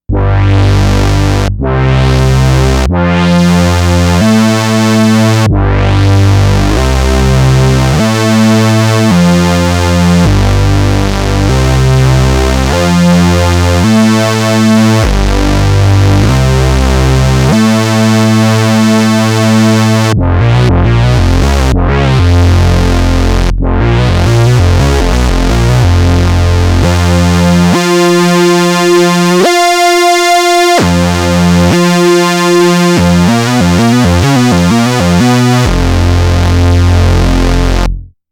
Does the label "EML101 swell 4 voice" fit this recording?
Yes